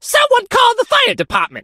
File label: crow hurt